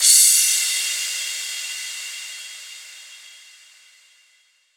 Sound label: Crash Cymbal, House; House Kit; Drum One-Shots; GhostHack; Ghosthack Advent Calendar - Day 3 - House Kit